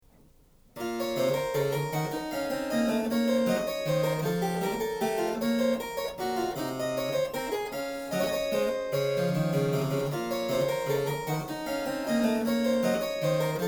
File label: menuet, french suite